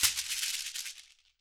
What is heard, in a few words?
127 South American Shekere End; World; Percussion